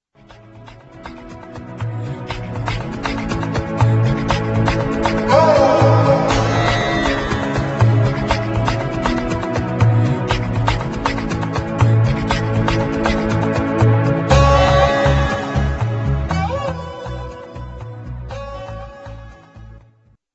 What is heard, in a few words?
saz